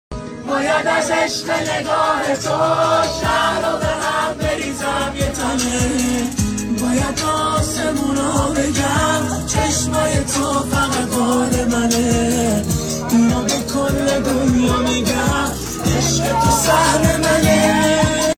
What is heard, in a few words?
Gitaar